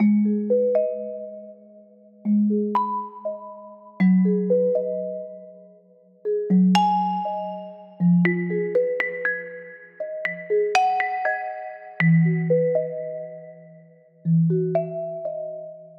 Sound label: Mallet Loop 120BPM G#min; 99Sounds; Monosounds; Music Loops; Instrument Loops